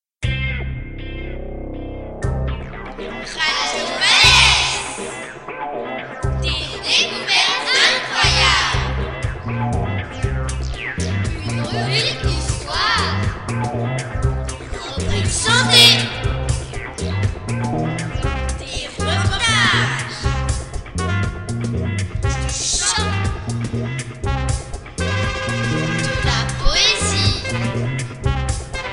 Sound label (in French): chant